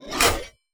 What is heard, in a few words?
butcher strike